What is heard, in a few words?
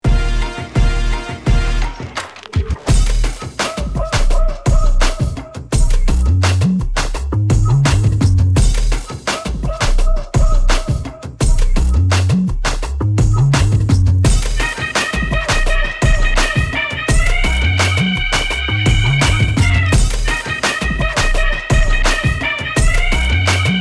Fight BGM Full Version; bollywood